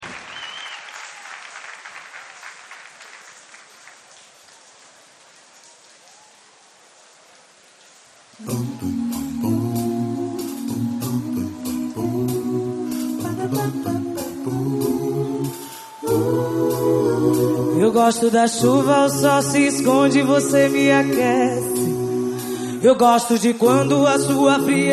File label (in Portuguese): ao vivo em Salvador